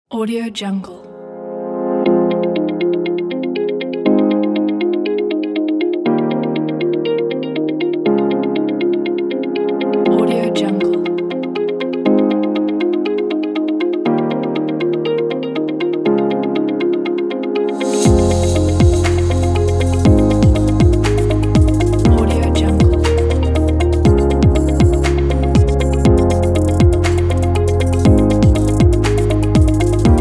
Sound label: modern technology presentation; doc-med